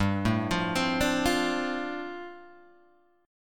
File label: G 7th Suspended 2nd Suspended 4th, Guitar Open G